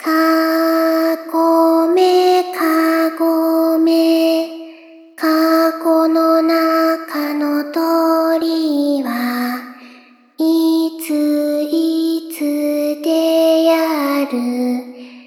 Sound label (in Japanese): Kagome Kagome Reverb